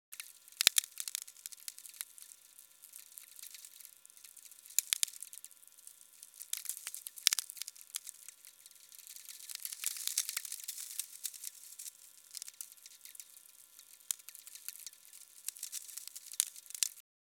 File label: elf